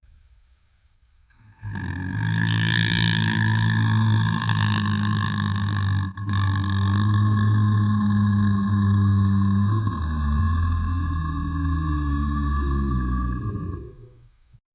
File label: megalosaurus roaring and; dinosaurs